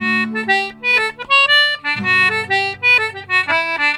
Celtic Flavours; USB Soundscan vol.42; AKAI

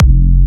Jump Kicks, Bassdrums

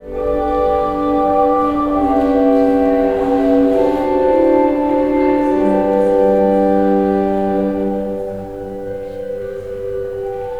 IN CHURCH, Cathedral Organ; ILIO; Propeller Islands

